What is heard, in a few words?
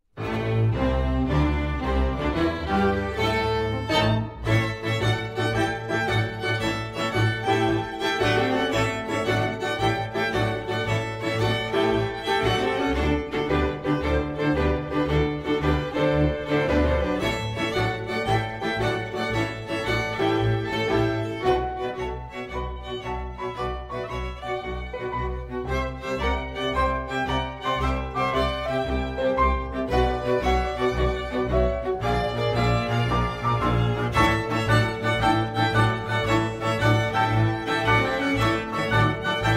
Blues